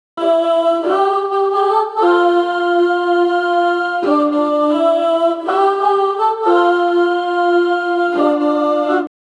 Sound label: house music vocal sample